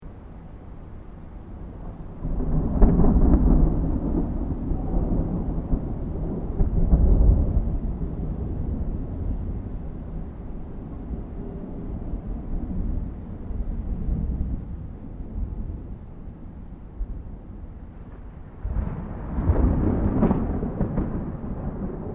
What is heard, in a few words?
THUNDER DISTANT STEREO; Elemental weather effects